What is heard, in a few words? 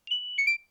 nokia 6100 ringtones tweet